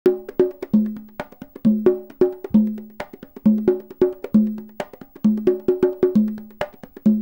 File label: congas 133BPM